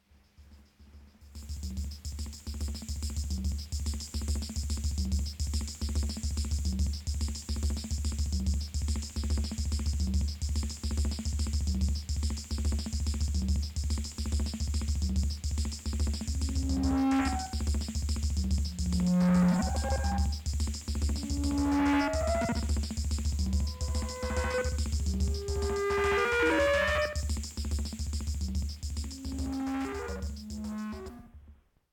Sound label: TACTILE ORGAN